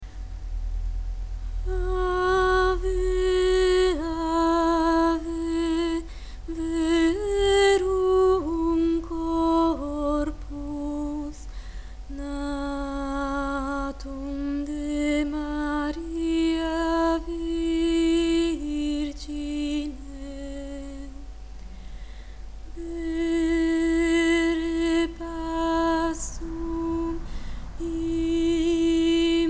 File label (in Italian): contralti; Ave verum corpus